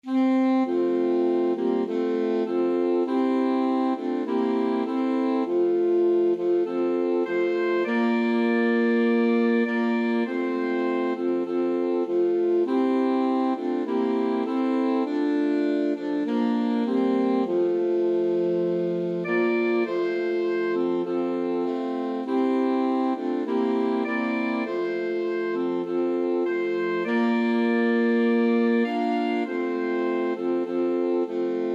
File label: auld lang syne, alto sax quartet